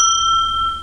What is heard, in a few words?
ting; Mosquito